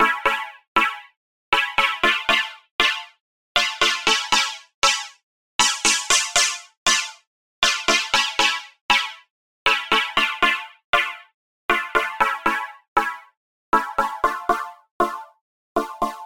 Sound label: key pop 118 bpm; loops; sc essentials